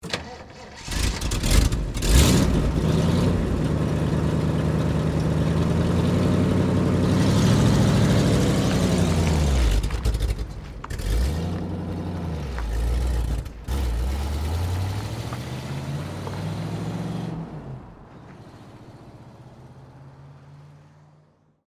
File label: Jeep Start Away, Automobile